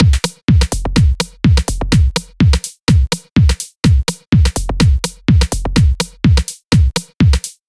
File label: Housed Beat